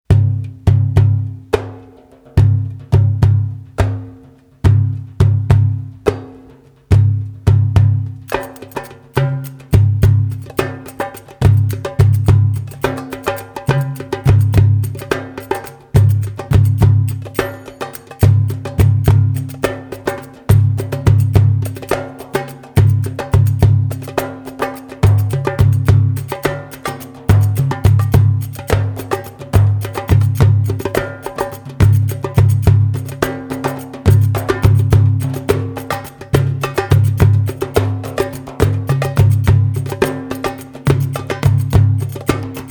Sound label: Debke Jwaad, belly dance rhythms